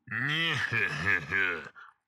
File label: nyehehe; shady-guy